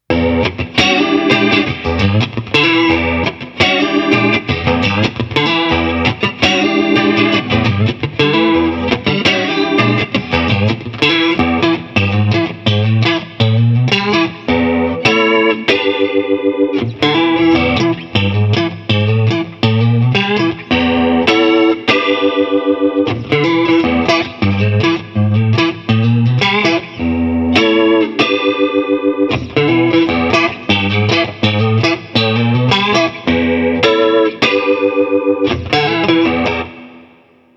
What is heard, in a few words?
rotary